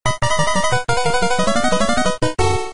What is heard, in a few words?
8 bit